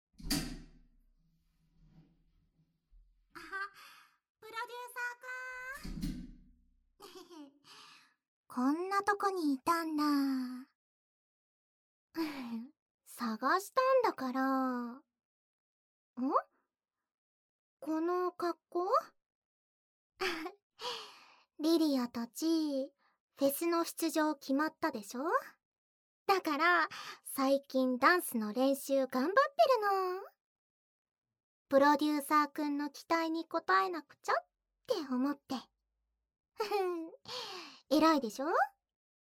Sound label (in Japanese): 水音・耳舐め残響なし; SE＆耳舐め残響なし